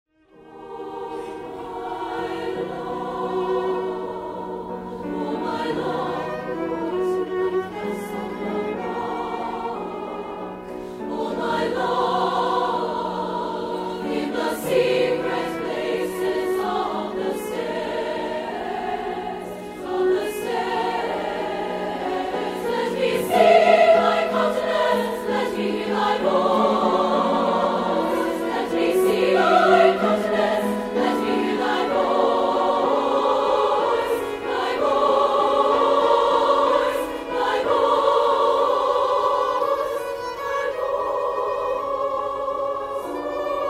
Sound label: Treble Choir; TMEA 2000